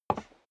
step sneaker wood creak single right; schritte

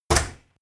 oven door close

